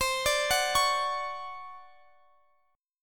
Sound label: D Major 7th, chord; Dmaj7/C; arpeggiated